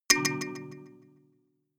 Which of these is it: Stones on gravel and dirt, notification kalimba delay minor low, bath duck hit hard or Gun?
notification kalimba delay minor low